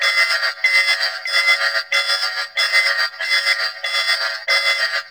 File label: Infested Mist Lantern Equipped No Energy Loop; Warframe; Infested Eris; Encounters